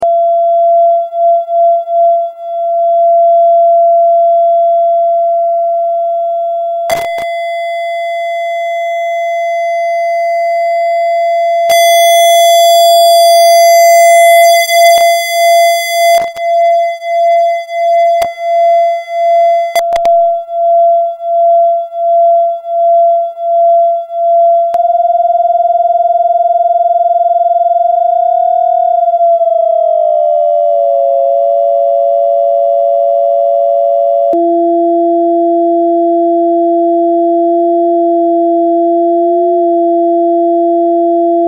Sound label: phasing